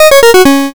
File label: gameover